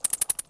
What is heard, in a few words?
bats; Cars; XBOX